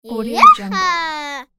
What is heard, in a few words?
little girl wild joy scream